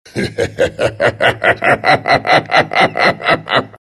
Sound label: dragon knight laugh; Dota 2